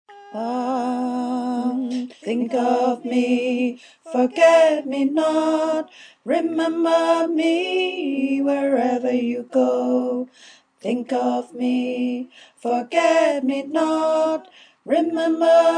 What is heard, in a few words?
alto